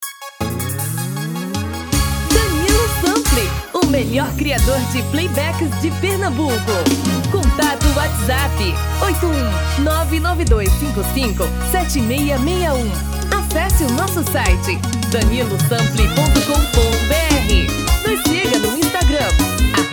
Forro

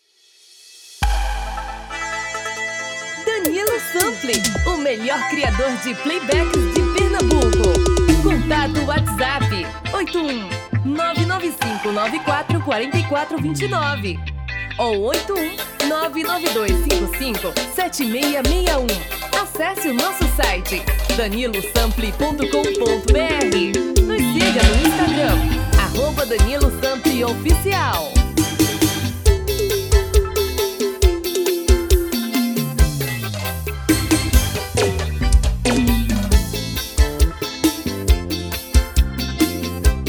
Forro favela

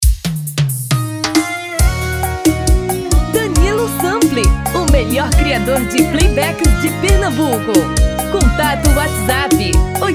tom feminino

